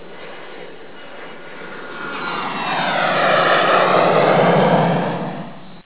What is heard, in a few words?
jet pass